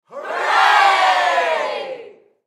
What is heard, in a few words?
Human Crowd Studio; Crowd Reactions Hooray; Blastwave